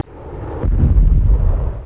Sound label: NAPALM, Military Sounds; MineSweeper v3